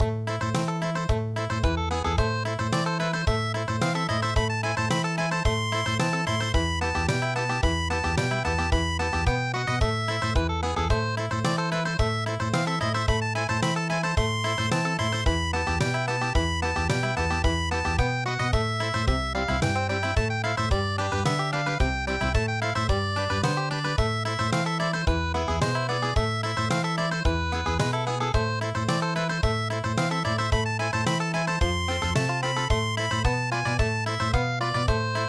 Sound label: Rondo Alla Turca Country